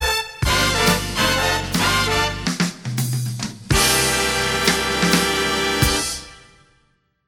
Talk Show Guest Entrance Fanfare

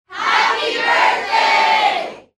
CROWD REACTION

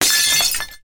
GLASS SMASH

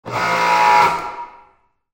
POWER WASHER

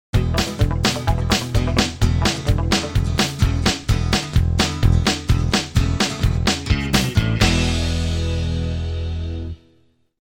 STING

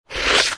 Clothes Boot Construction Scrape Concrete

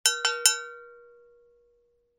COWBELL

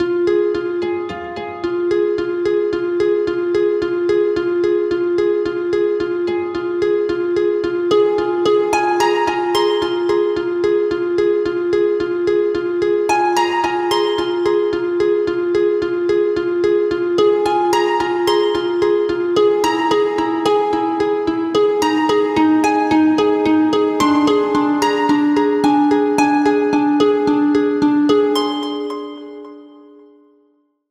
Harp Strings

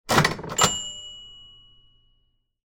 Cash Register Open; Boston

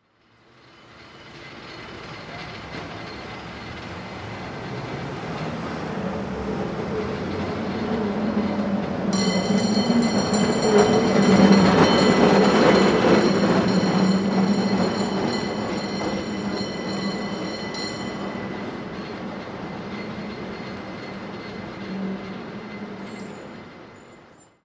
CABLE CAR

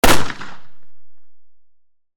GUN RIFLE

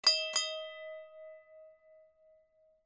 BELL PULL

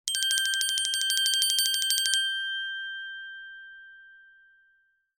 PERCUSSION ORCHESTRA BELLS

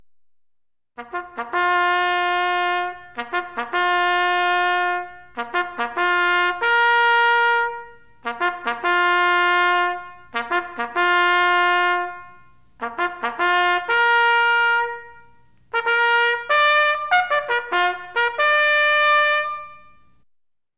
Slow Bright Military Bugle Reveille Call

